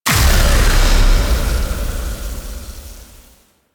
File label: Gate Explosion; Warframe; The New War; Narmer Outpost